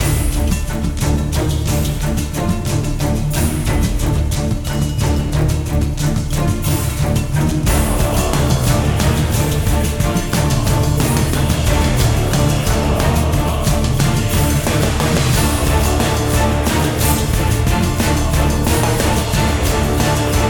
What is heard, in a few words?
music medusa; Hercules